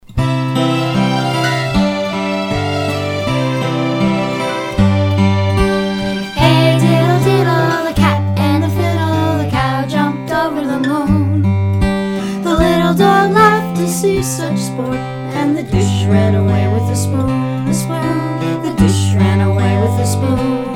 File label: hey diddle diddle vocal